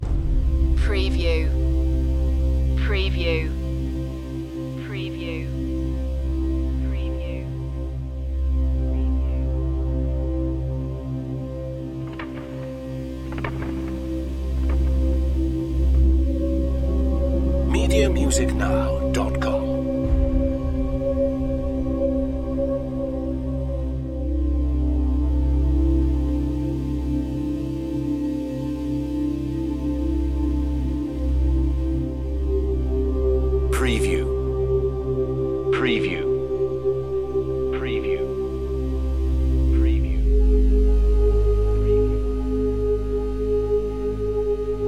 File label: after dark no female voice